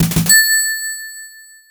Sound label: confirm end act total point counter end; sonic the hedgehog 3 knuckles